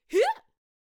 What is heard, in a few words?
Hay Baler Attack Vocals